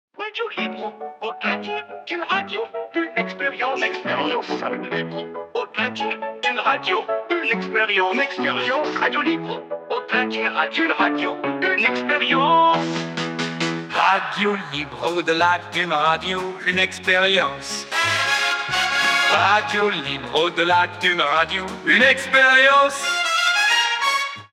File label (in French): Jingles Radio chante Old Time; Radio Libre